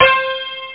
chorus piano; OctaMED SoundStudio V1; jazzies samples

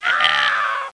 POISONED

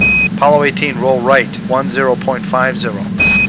roll

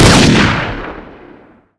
dragunov; The Sum of All Fears

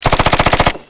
shot vulcan

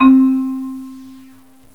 Marimba; GMTones; Chromatic Percussion

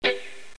noiz; Music Toys; star

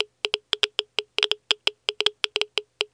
geiger low; Messiah

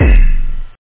KID KIK, DRUMZ

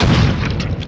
attack

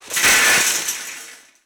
Crushing Barbed Wire; Demo1944 Battle Of The Bulge

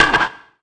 bat hard; hb6demo

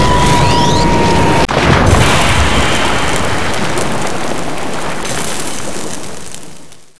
splash; XMEN2

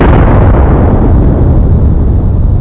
Deep Explosion

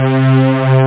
Phaser String; MarcDrive

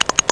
Double Click; ProOne: Netcracker Netscape Navigator

